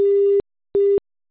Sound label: Reorder, IP Phones; Cisco; IOS; 7942G; English